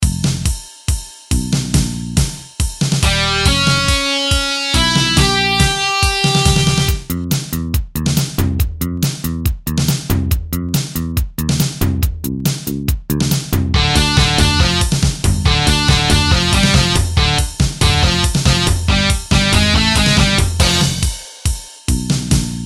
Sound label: Dark And Moody Tune